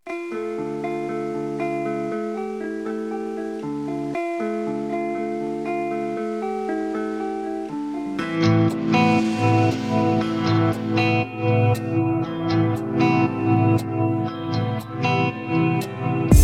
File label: instrumental; persian